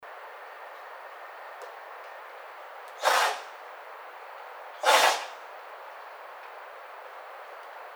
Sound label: White-tailed Deer